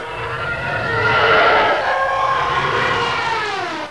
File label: fly by; tie; star wars trench